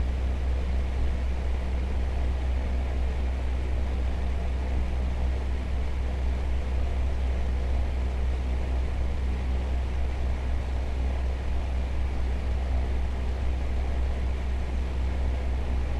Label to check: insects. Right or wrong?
Wrong